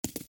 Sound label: Walk; troll face quest unlucky; android